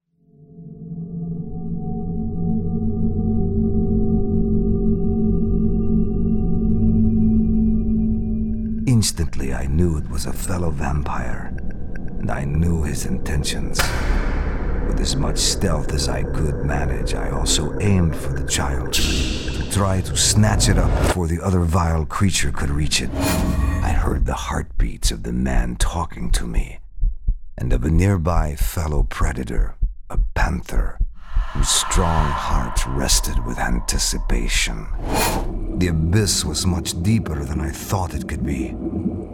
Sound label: Vampires Screech